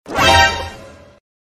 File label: suspense build